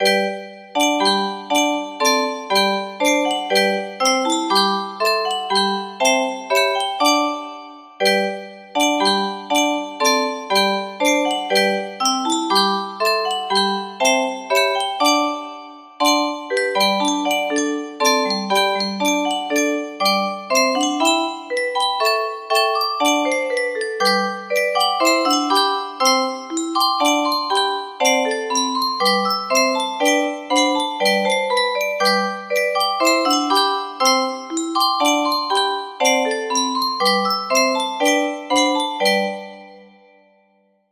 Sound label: national anthem germany, music box